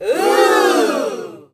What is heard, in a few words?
reaction